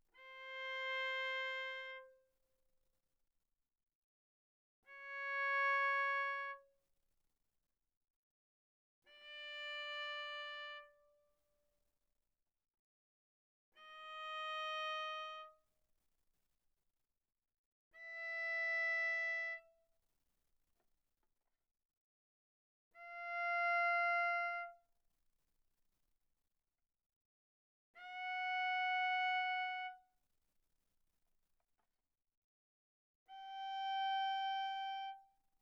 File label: Viola arco sul A mf C5 B5